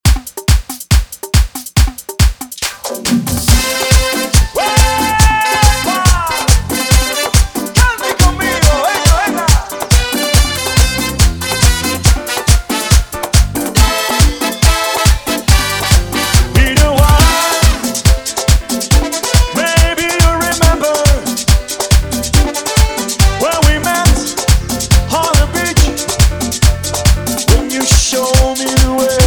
directo 140bpm

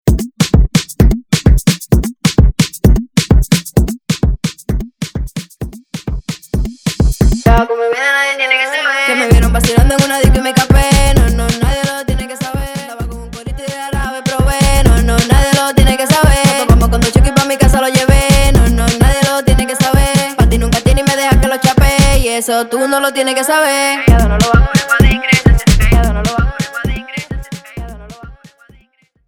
Intro Verso 130 Bpm

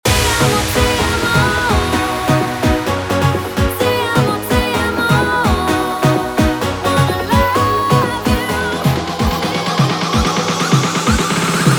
guaracha remix 128 bpm

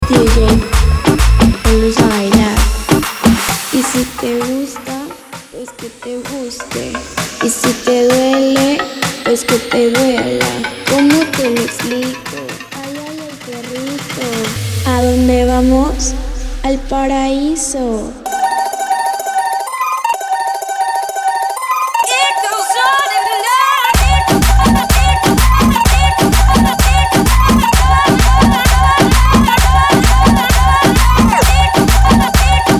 Original Mix 130 BPM

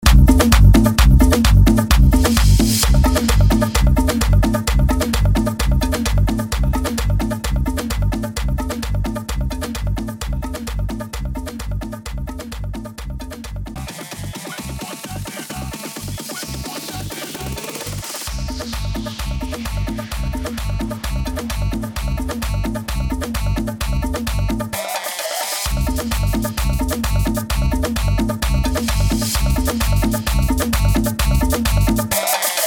Piano Guarachoso 130 bpm

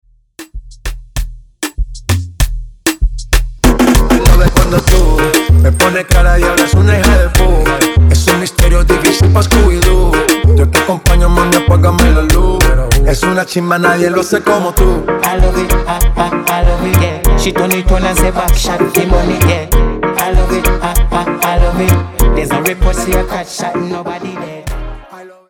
Intro Verso 97 Bpm

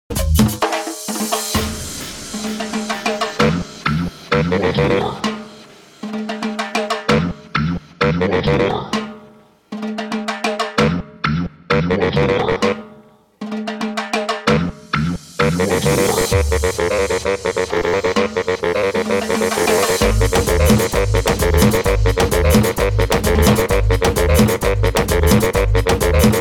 guaracha 130 BPM

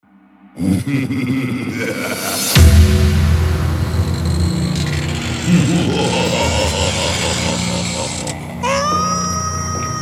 Inicio Hallowen 95bpm

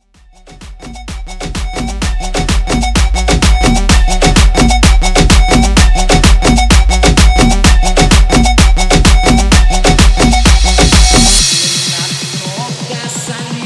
Guaracha Bootleg Remix 128 Bpm

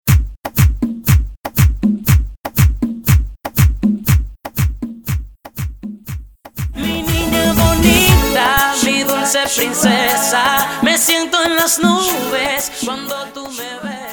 Intro Verso 121 BPM

